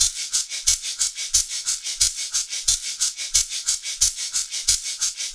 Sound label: Industrial Shaker, processed; Sonic Foundry (Sony Creative Software)